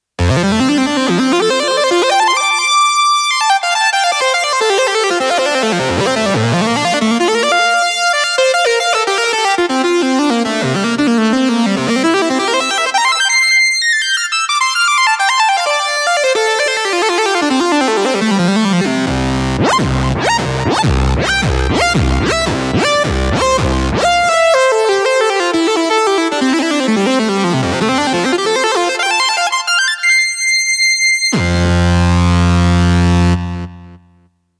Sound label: saw lead